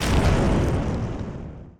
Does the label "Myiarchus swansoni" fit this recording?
No